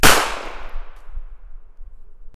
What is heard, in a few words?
gunshot single; mike koenig